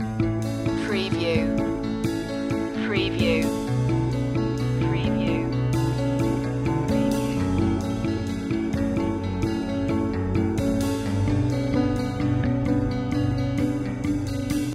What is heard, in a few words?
easy living loop